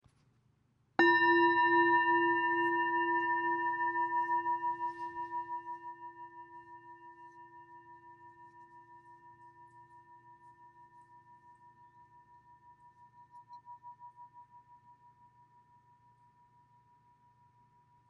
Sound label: bowl sound, meditation; yoga meditation breathing stress reduction relaxing